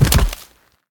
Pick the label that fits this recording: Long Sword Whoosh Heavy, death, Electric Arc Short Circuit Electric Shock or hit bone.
hit bone